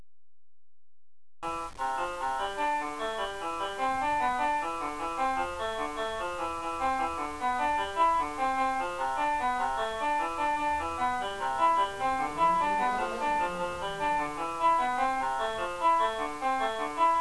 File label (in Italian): suono pi greco 100 cifre; Pi greco musicale